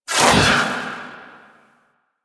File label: Doom Sword Whoosh; Warframe; Excalibur